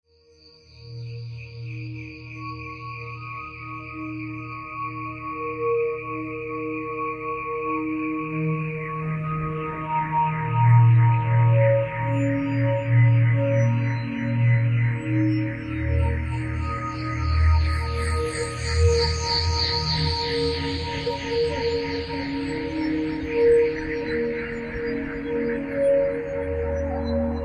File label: meditation; relaxacni hudba